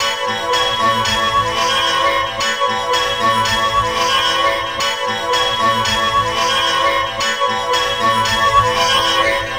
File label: Ciggy Guitars, Strings; Sonic Foundry (Sony Creative Software); Myths of Technology